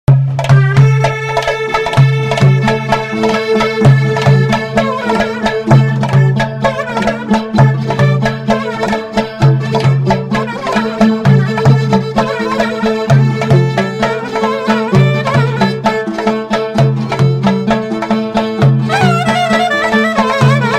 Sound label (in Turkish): ciftetelli; oynak zil sesleri